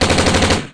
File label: gun